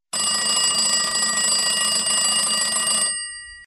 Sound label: phone; disney; mashup